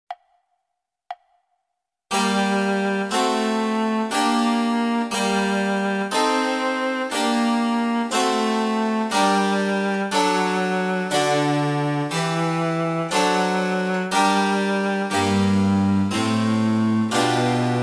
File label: oboe sonata; handel; hwv364a